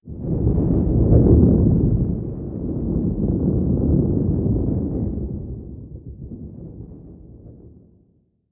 earthquake rumble; Call of Duty Modern Warfare

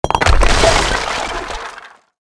fence stone wood; World of Tanks Blitz

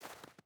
footstep barefoot snow walk; Rust

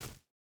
footstep hide forest jump start; Rust

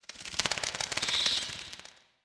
bat; World of Tanks Blitz; hangar halloween 2019

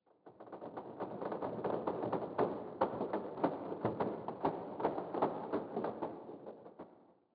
sting tree creak; Rust

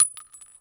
Inf Weapons; Red Orchestra 41-45

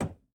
throttle lim, aircraft; DCS

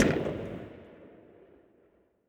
Hispano RH End; Weapons; DCS

